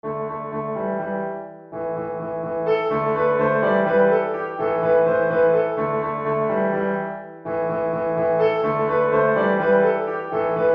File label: Study for Piano